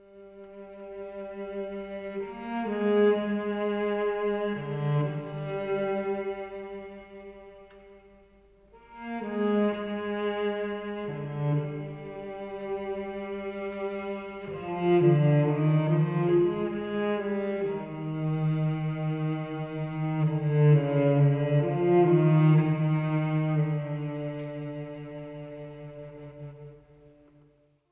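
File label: violin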